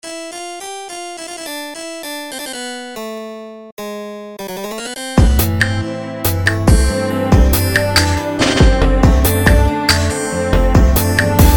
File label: soul instrumental